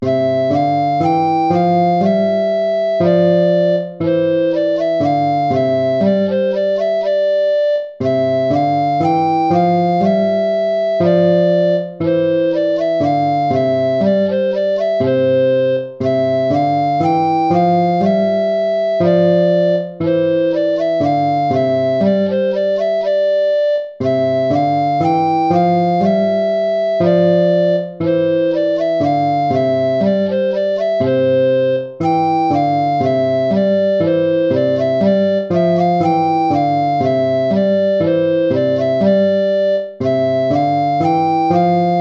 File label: gavotta; hook james